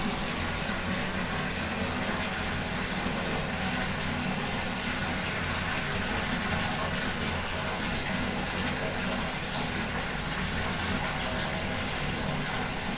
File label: water in bath, 風呂に湯入れる; 風呂に湯入れる４/外; wetarea